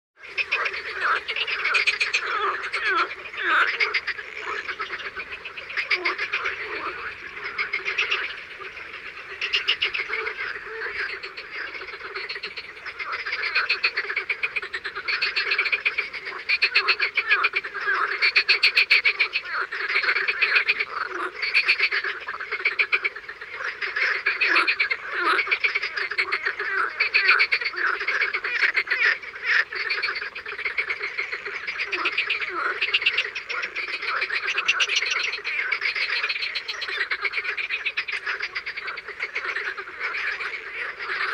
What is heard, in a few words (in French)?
BATRACIENS AU MATIN